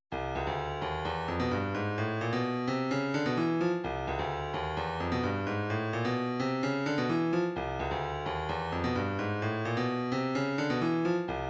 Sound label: 130 snare